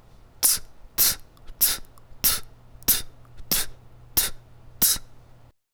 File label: hihat, beatbox